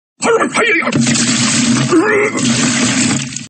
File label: Funny vomit sound effect